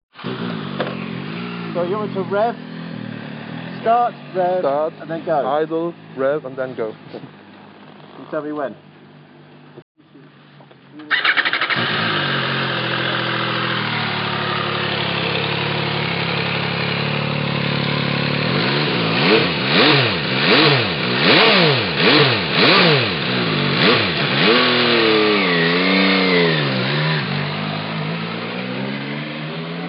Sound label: MV Agusta B800